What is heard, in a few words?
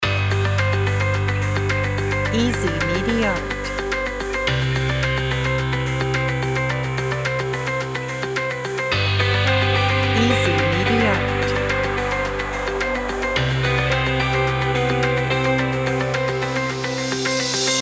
positive message loop